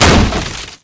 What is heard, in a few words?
Gift Crate Death